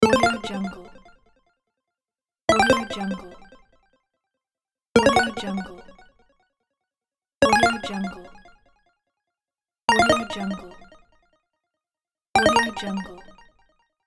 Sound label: retro game points